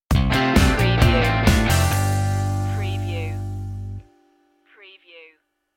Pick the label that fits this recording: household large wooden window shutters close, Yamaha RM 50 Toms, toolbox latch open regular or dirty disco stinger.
dirty disco stinger